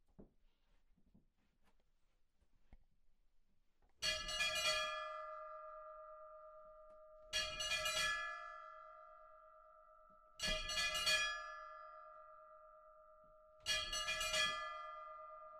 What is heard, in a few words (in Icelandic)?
hjardarholtskirkja fyrir messu, kirkjuklukkur